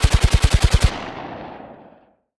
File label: combat atmosphere; World of Tanks